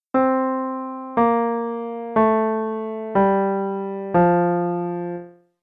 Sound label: DOWN Bass F maj